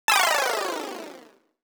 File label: arcade retro game over; mixkit